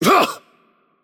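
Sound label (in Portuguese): Attack Heavy, Brazilian Portuguese; bloom minigame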